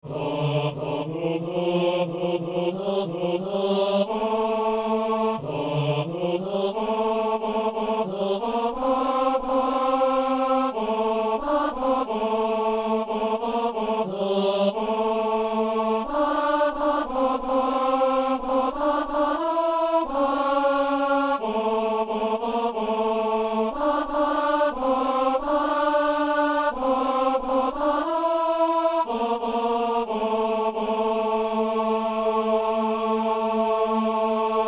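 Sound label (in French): ténor; messe inaugurale; acclamation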